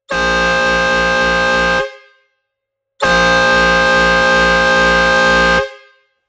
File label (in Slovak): klakson 12v 24v chromová 2 tónová fanfára truck 29cm